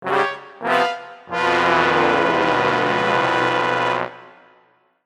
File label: Music Race Fanfares; game music